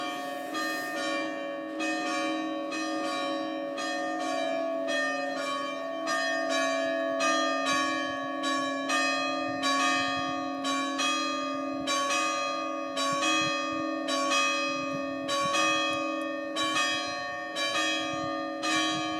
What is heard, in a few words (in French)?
Borne Santé Covid19 coronavirus appel Évêques cloches